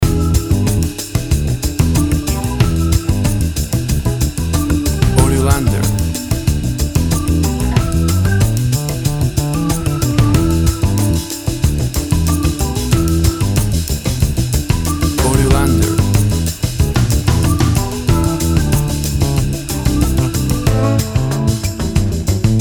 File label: Tropical Acid Jazz Music